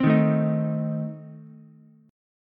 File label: A Diminished A 5,3,5,6 down Ukulele; Adim strummed; JGuitar